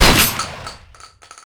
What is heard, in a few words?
JACKHAMMER